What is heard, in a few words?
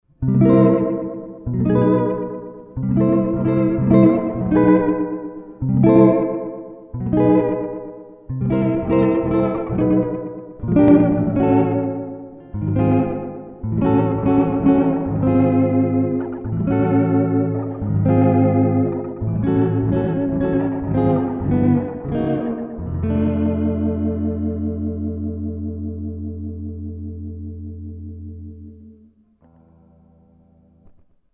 Vibrato at 3 o clock Delay at 9 o clock